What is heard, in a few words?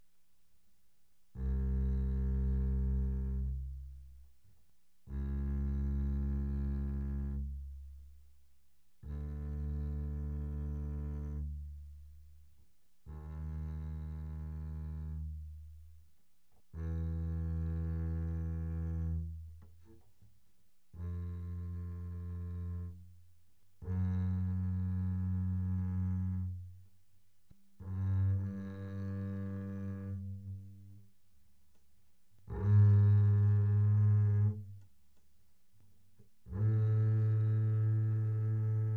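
Bass arco pianissimo sul E C2 B2, doublebass; Strings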